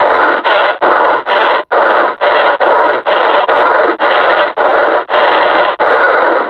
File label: panting, suppressor